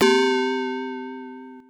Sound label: SYNTH Bell synth